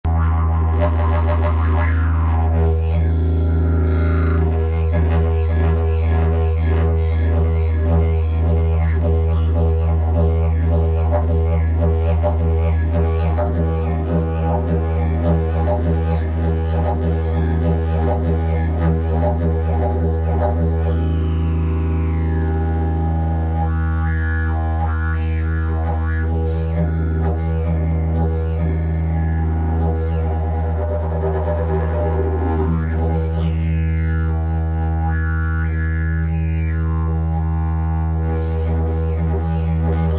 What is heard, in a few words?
didgeridoo; jilm exklusiv